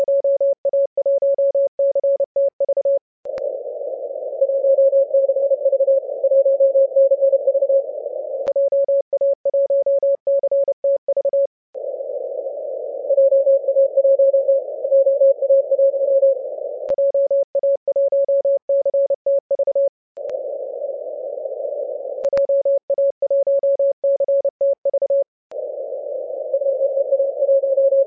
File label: 10mhz